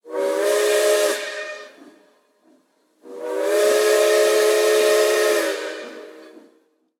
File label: train tutu